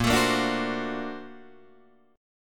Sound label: Bb Augmented Major 9th Bb down Guitar Open D